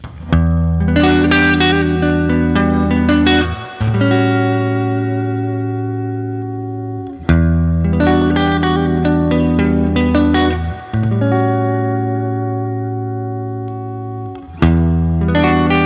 all positions starting from neck, pickups

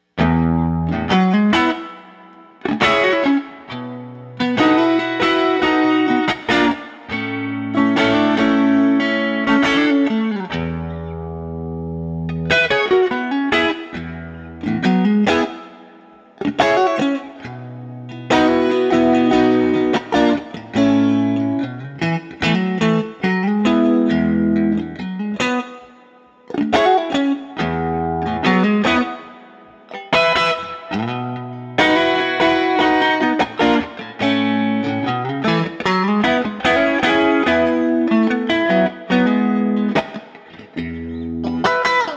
Stratocaster; Epic 1955

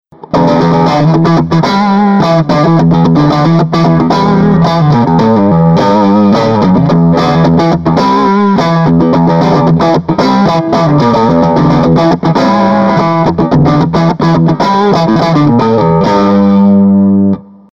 61 tele neck dirt slop, pickups

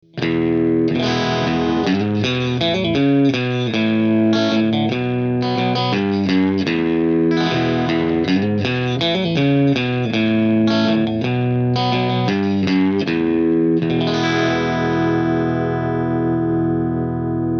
Middle Bridge Dirty

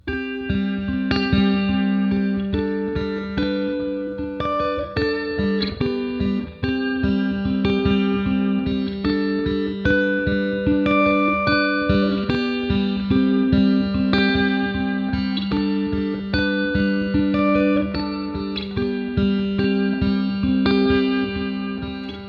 neck, pickups

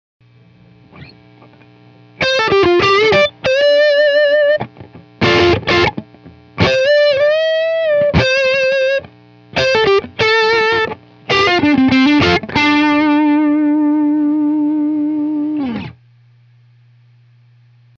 1958 neck, pickups

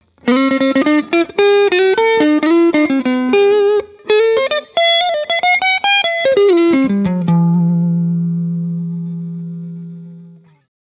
'50s Tele Neck Swing Lead, pickups

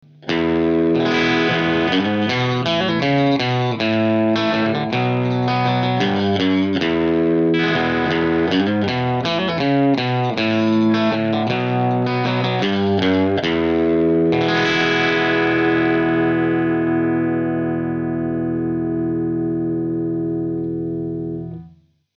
Bridge Dirty

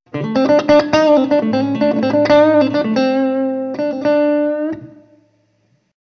Dallas Blues Middle Neck, pickups

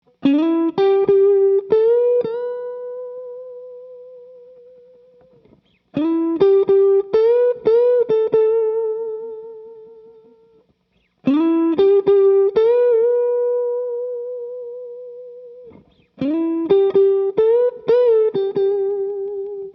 Neck Middle, pickups

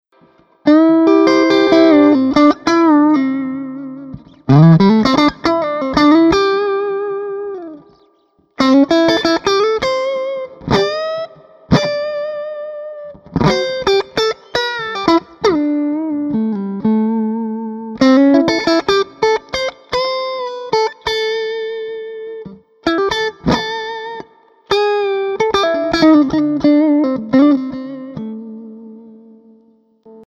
61 tele neck clean, pickups

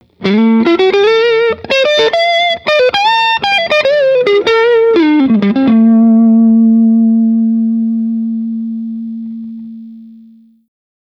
'50s Tele Neck Lead, pickups

